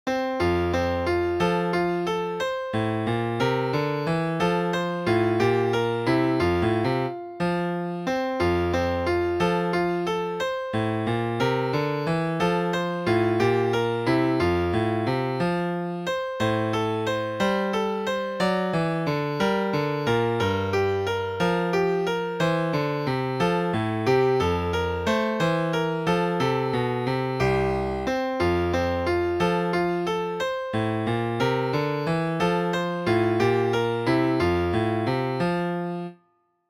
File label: Old German Lullaby; Piano